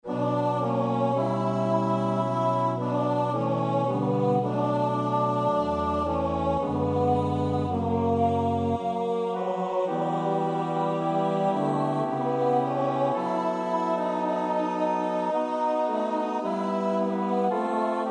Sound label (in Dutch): T-B, koorpartij oefening; Refice (Licinio); Missa sanctae theresiae